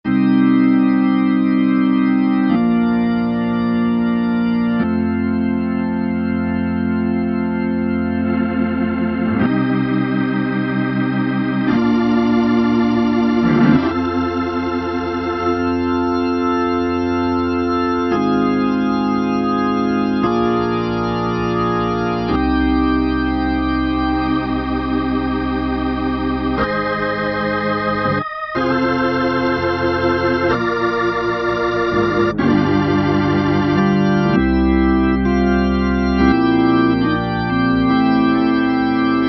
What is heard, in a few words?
B-3 Full Xfade; Keyboard Instruments; Roland L-CDX-02 Keyboard Instruments